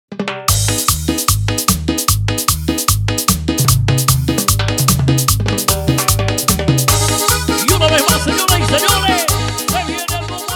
Instrumental animado Chicha, BPM 150

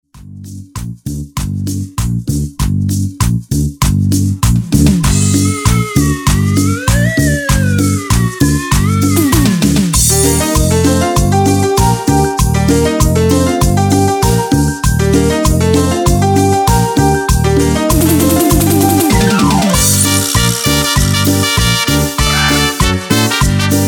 Extended Rework Cumbia Chorus, Bpm 88

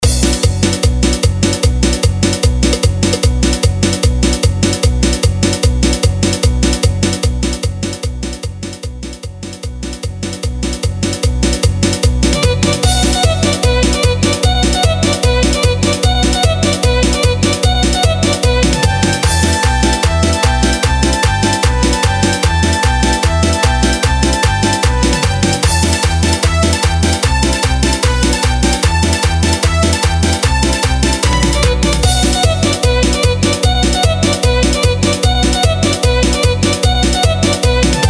Intrumental, 150 Bpm

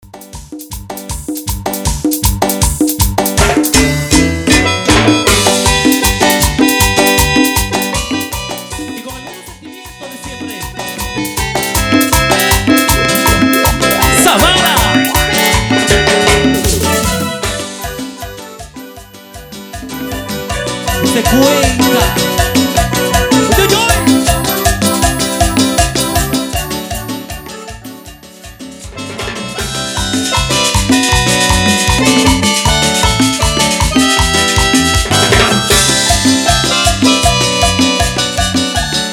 Intro Simple, Bpm 157

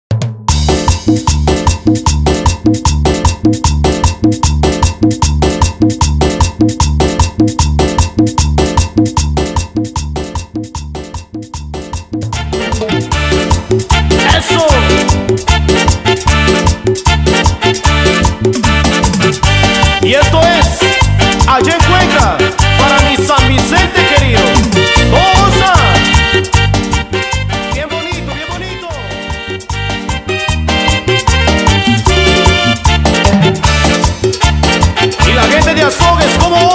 Bandita Intro Simple Bpm 152

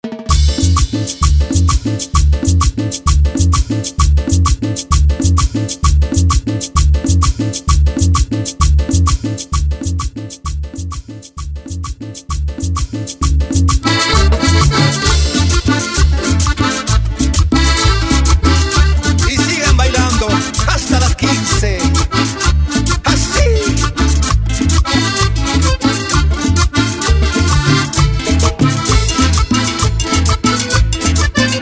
Intro Simple, Bpm 130

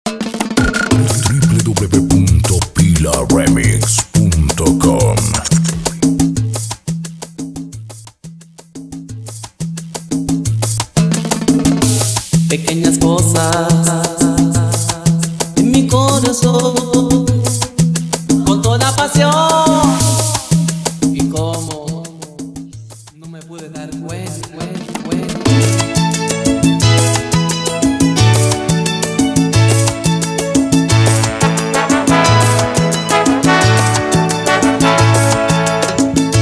Salsa

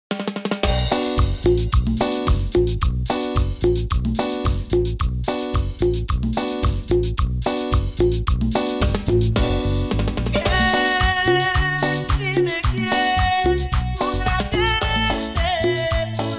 Intro Acapella 110 bpm

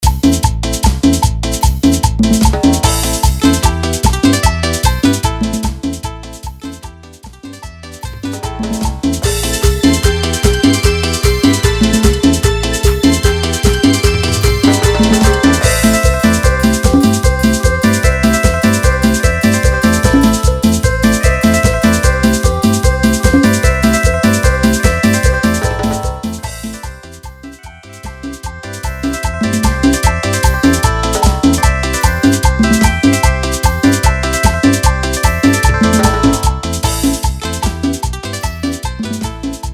Instrumental Chicha

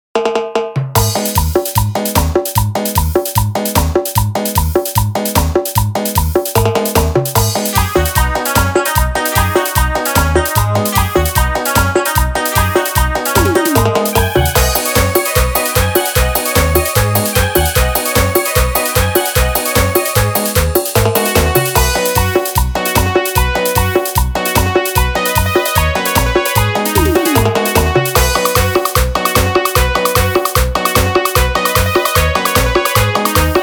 Instrumental BPM 150

